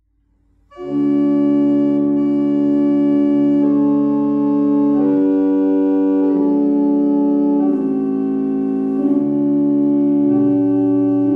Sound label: Colchester, barrel organs; Raithby